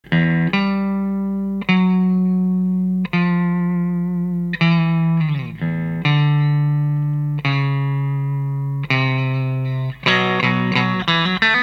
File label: FUZZ DEVIL OFF; FUZZ DEVILのセッティングは写真を参照してください。